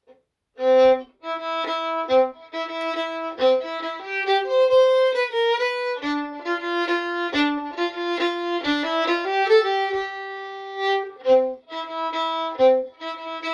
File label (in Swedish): vals